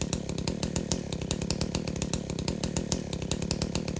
melee; (unused) chain blade

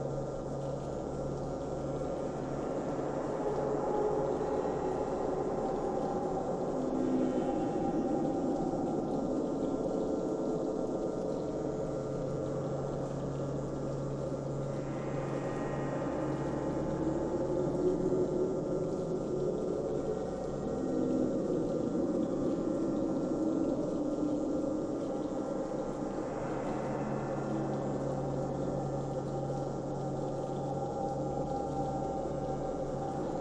ambient

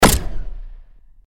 weapon; Exoskeleton (unused); SMG

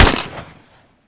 weapon; Makeshift

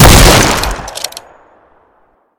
weapon; Ruinoid

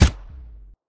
weapon; Barebones

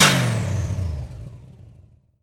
weapon; Default Compressor

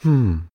sigh

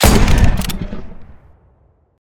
weapon; Cursebound

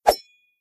swsh

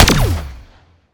weapon; TA-97

